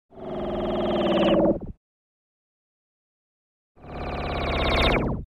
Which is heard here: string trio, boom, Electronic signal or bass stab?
Electronic signal